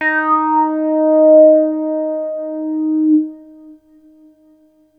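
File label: bass D#1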